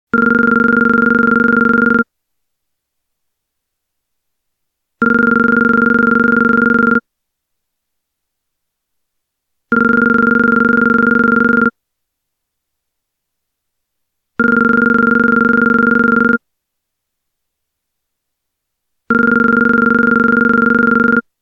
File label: weird vintage phone ringing